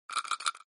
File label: dice roll